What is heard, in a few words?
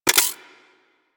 Akimbo Pistol Reload Passive; Warframe; Weapons; Corpus; Briefcase